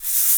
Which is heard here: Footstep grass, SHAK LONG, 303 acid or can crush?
SHAK LONG